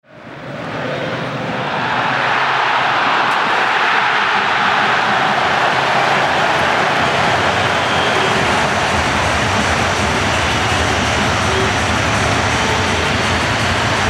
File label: soccer crowd after a goal